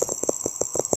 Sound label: sound of a character slow, potion